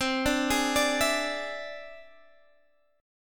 E Augmented 7th C

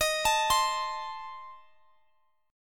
A Diminished Eb

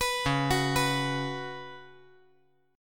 C sharp 7th Suspended 4th C sharp

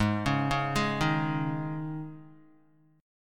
G sharp Suspended 4th, Guitar; G#sus4; arpeggiated; 3,4,1,1,3,x; 4F,4A,5C,5G,5C,6E

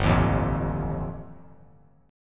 G 7th Suspended 2nd Sharp 5th G chord; strummed; JGuitar

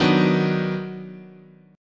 D sharp Minor Major 7th Sharp 5th, guitar chord

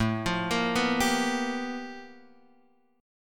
G 7th Sharp 9th A chord; G7#9

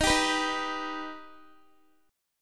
Eb Augmented, Guitar; strummed; x,18,17,16,15,x

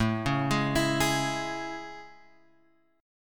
A Major 7th A; AM7 Chord {5 4 6 X 5 4} arpeggiated

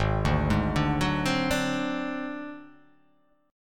G sharp 7th Flat 5th G sharp down, chord

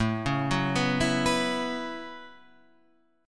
A 6th Add 9th A down Guitar Half Step Down

